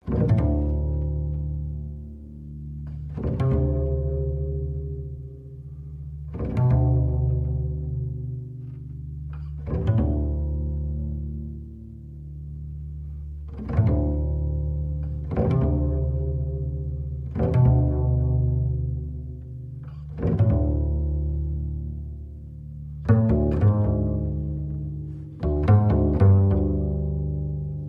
Stream, accordion Trio + String Quartet

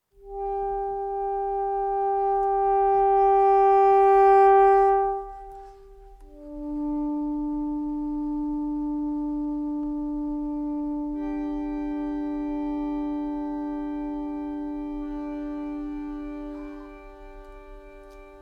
sax akkord, accordion

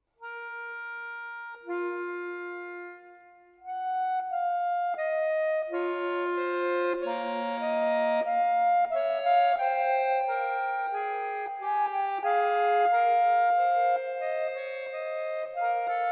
Fugue in B flat minor, accordion

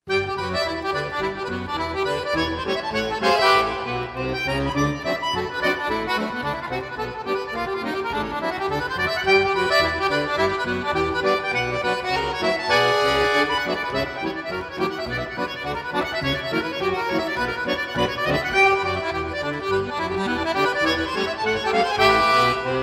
Accordion Rag

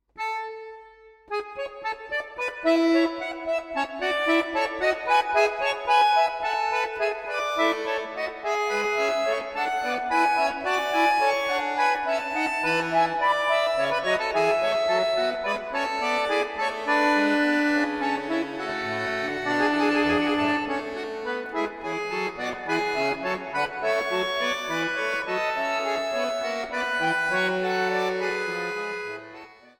Fugue in A major, accordion

